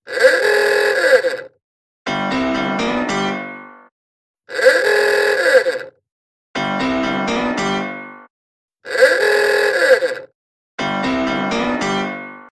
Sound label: riff piano